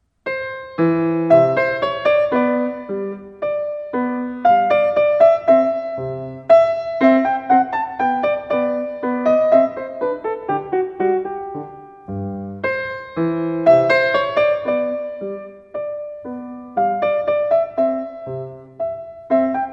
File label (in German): Menuett; mozart